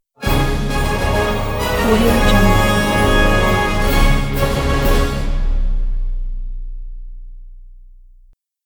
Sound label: bright orchestral fanfare logo; fredlmusicproduction